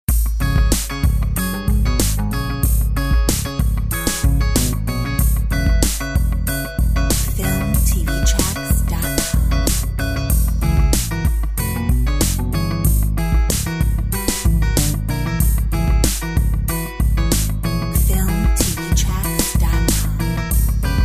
Funkadelic

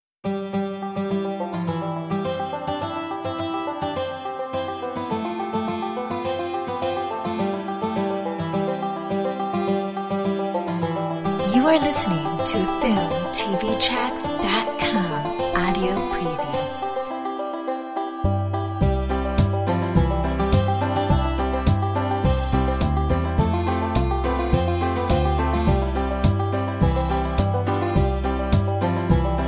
battle banjo

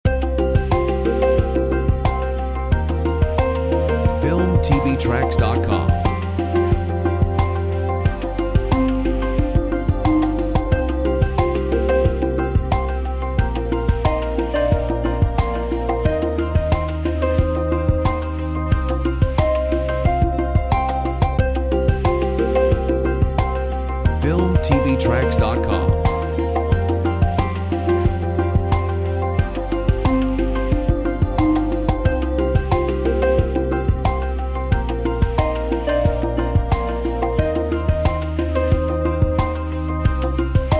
Corporate cool; Filmtv-tracks

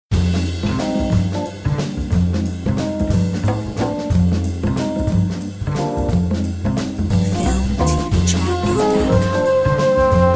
Jazz Quartet

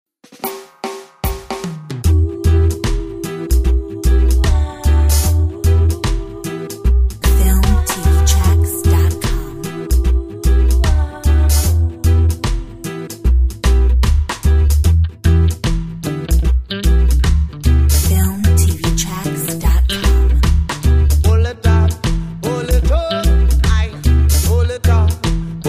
Reggae soul

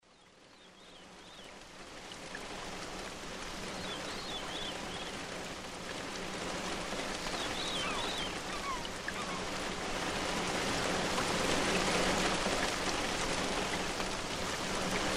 Ambiance Nature

